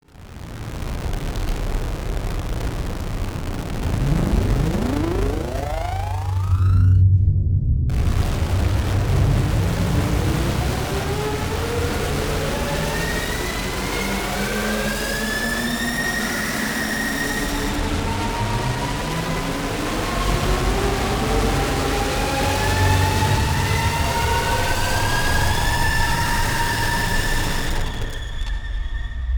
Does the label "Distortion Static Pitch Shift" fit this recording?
Yes